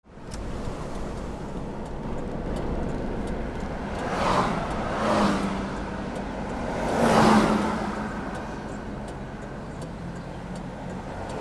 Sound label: CARS